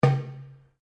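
Drum Darabuka Edge Slap mezzo forte, Egyptian Darabuka Drum; Drums; Musical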